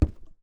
FOOTSTEP Metal Board Run Barefoot Male; Timothy McHugh; Barefoot on Metal

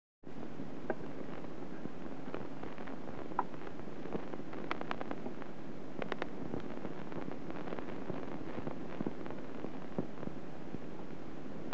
BT Lines AND Tones, Local Line; Computers Printers Phones; BBC Sound Effects Library

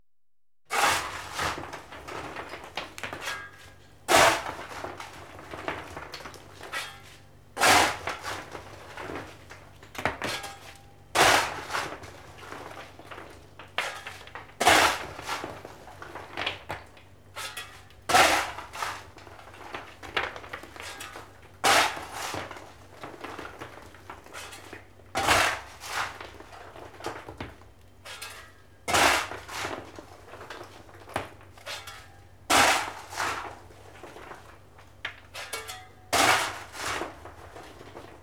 Paddle Steamer, Stoking Boiler; Ships And Boats; BBC Sound Effects Library; Ships And Boats 1

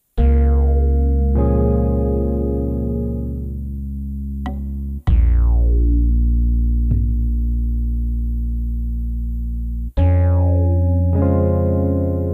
Ambient A Zyow

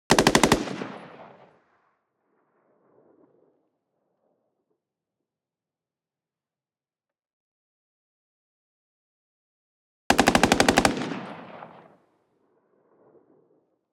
XM8 Auto Distant Exterior, Battle Rifles; Watson Wu

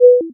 question, Interface Sounds; Kenney's Sound Pack

